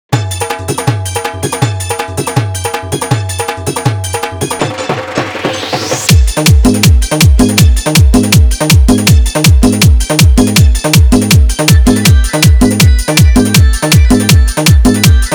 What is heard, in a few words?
Fully Hard Bass Dance Mix